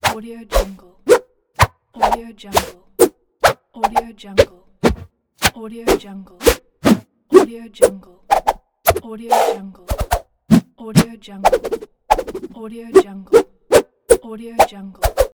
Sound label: whoosh